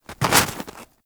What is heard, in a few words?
Armadillo Move Snow; Warframe; Characters; Hunting Critters